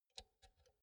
insert cinch quick

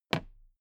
toolbox handle bounce regular

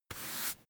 broom hand sweep

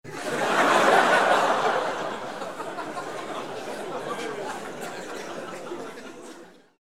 laugh long

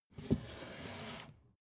book shelve soft short

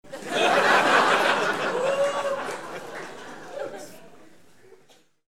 laugh with youh

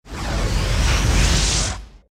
agressive swoshes short

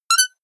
notification honk

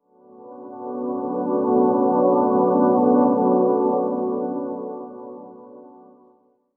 beauty chord

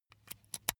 insert usb cable quick

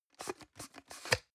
plastic can small lid screw open medium

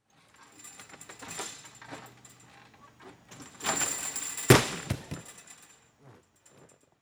men parallelbar

